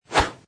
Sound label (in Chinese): shuaxin